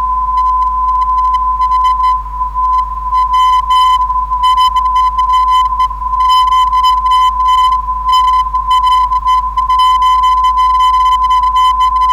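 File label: tw 1147khz, non-directional beacon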